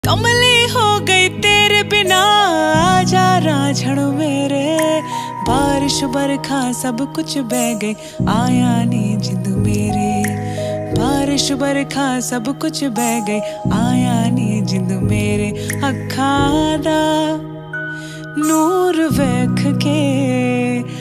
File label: uttrakhandi ringtone